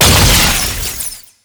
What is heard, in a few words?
Explosive Impact; Warframe; Weapons; Acceltra Prime